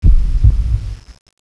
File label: slomo heartbeat